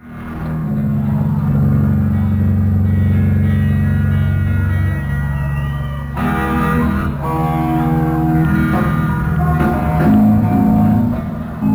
Tuning Up; City Hall, St. Albans

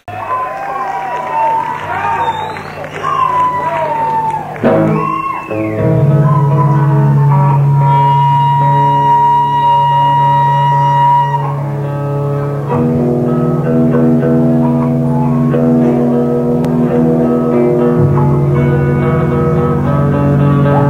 tuning

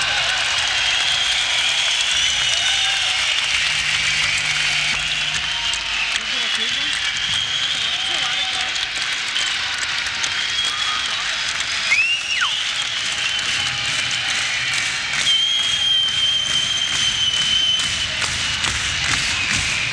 crowd noise; Idrätshuset, København; Idrottshuset, Copenhagen

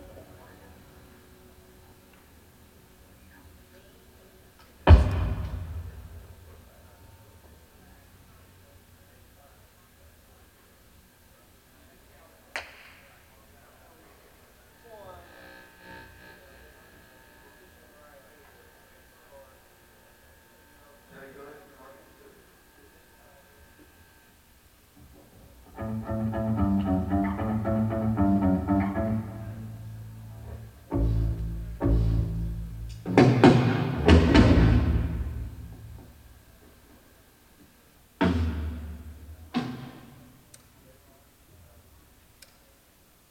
Soundcheck (tuning); Veterans Memorial Auditorium, Columbus

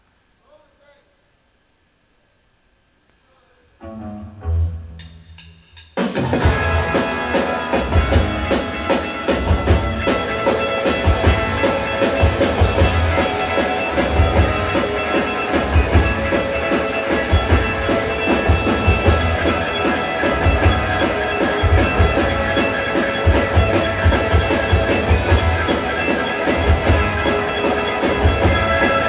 Soundcheck instrumental segment; Veterans Memorial Auditorium, Columbus

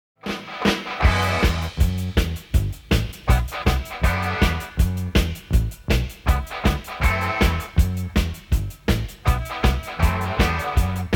Instrumental

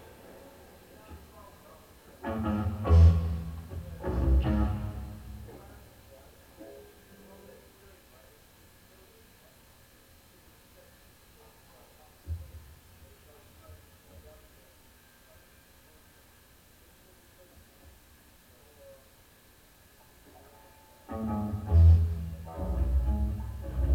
soundcheck, levels; Veteran's Memorial Hall, Columbus; Veterans Memorial Auditorium, Columbus; tuning

